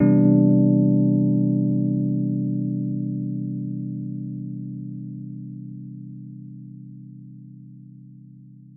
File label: C Maj 9th